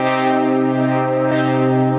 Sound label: Sun Set, synth lead